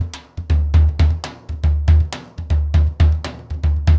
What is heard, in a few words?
Surdo Samba 120, Drums & Percussion; Best Service; Festa Latina VOL-1